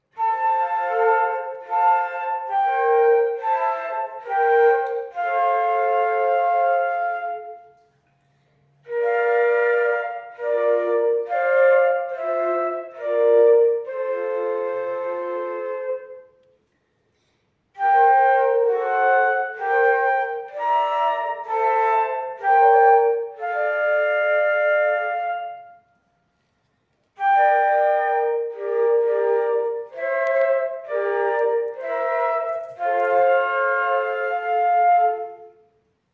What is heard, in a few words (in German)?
Leise rieselt der Schnee, Querfloetengruppe